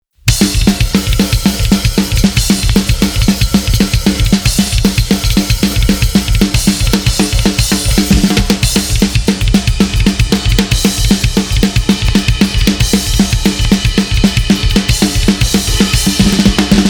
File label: 230bpm